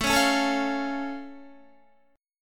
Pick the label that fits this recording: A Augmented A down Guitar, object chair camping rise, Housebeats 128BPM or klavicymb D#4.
A Augmented A down Guitar